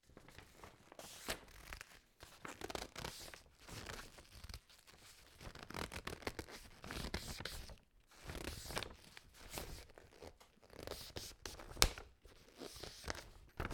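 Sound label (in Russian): складывание и выравнивание бумаги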